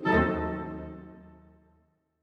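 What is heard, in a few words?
orchestra; Masterbits - Switch FX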